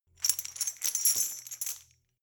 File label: metal chain handle clean